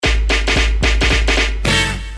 poison stinger

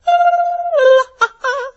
blblblbbll

